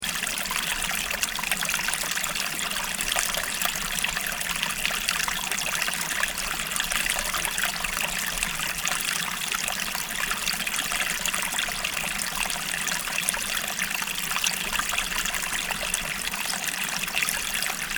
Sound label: nature (lower chapel)